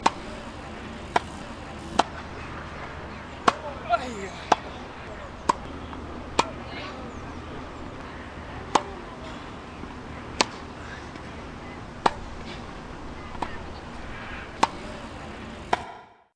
tennis

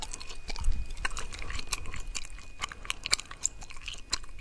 purukumi

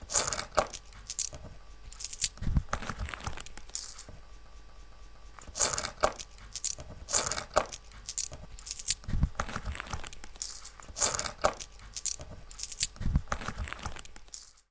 kolikot

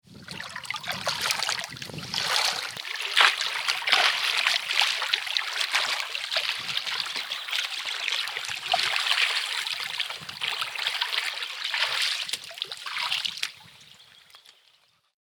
uiminen

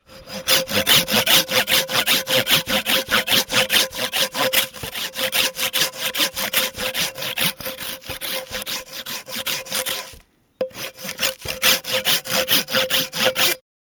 Käsisaha

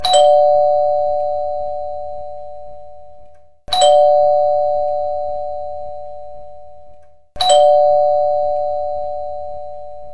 ovikello

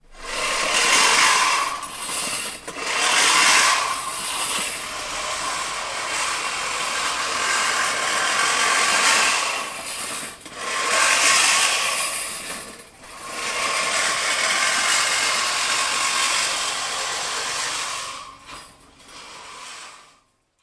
ruohonleikkuu

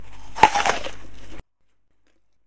puraisu

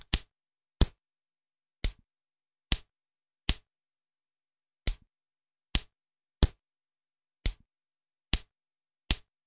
sytkari

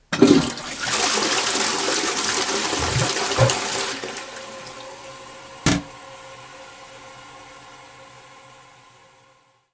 vessan vetaminen

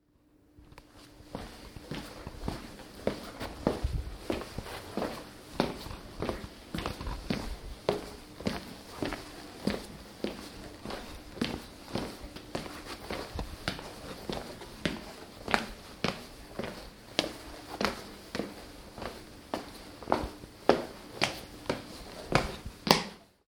askeleita

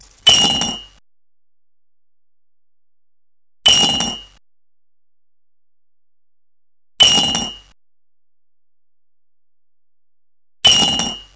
kolikko

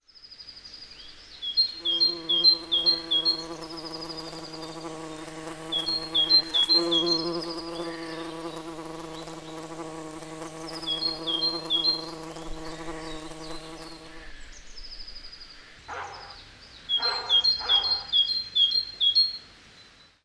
kesa

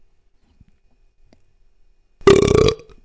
röyhtäisy

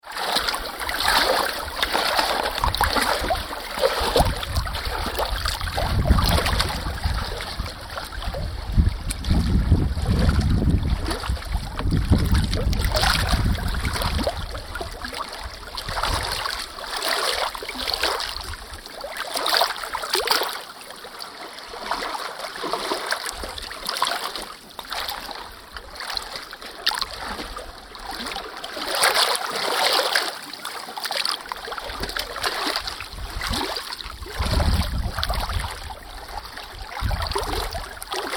Jarven aallot

